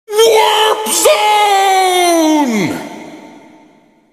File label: WARPZONE